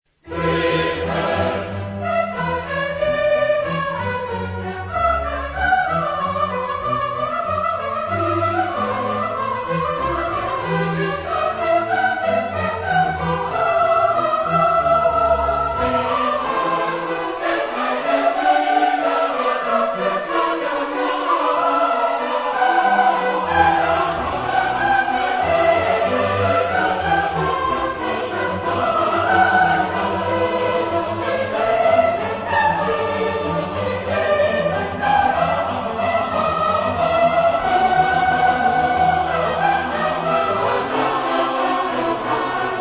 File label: Cantata, chorus; Bach; BWV64; welch eine Liebe hat uns der Vater erzeiget